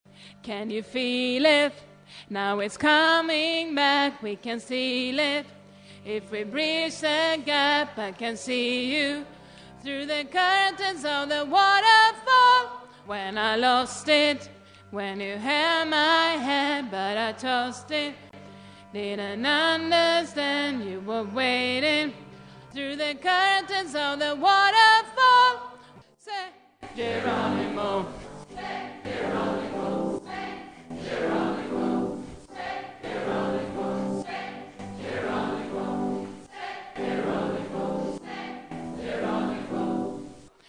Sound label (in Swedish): bas, stammor